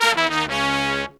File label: 120BPM, Horns